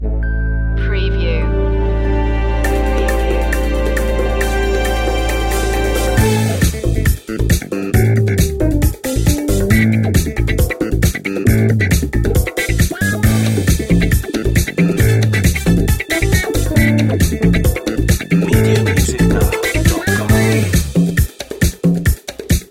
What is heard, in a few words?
afro disco funk